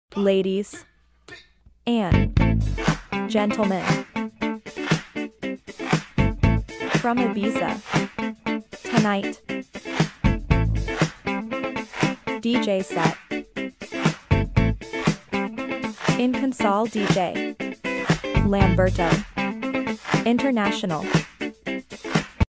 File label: jingle ibiza